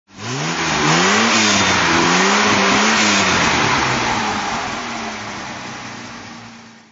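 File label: Jaguar XJ6 4.2 throttle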